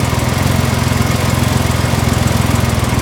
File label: Syclone Idle; car simulator 2